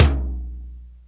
DEEP TOM; Back In Time Records; Big Bang

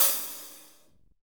HH, Real Kit

